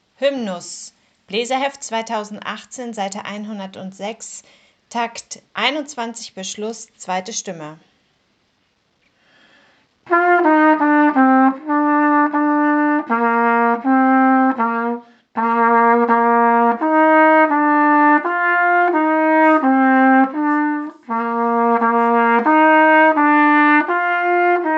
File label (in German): Hymnus Stimme 2, Posaunenchor; Takt 21 bis Ende